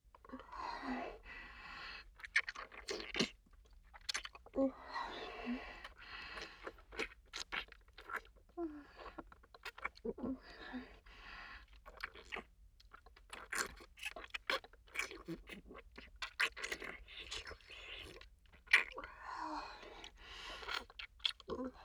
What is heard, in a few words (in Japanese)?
好きって気づいて!フェラチオループトラック, ASMR